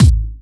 Hard Kicks; Vengeance Samples

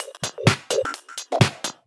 Electro Loop 128 BPM; Vengeance Samples

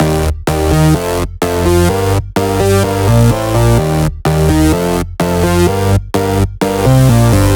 127BPM Melody; Vengeance Samples; Com Pop Kit

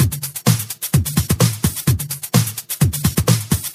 House Beat, 128 BPM

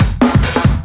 Fill 140 BPM; Loops; Vengeance Samples

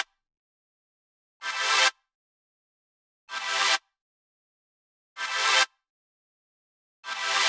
128BPM Melody; Vengeance Samples; Breaker Kit

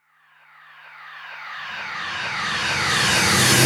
reverse, FX; Vengeance Samples

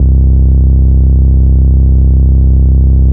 Bass Cuts; Vengeance Samples; VES1 Instruments

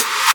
FX Scratching; Vengeance Samples

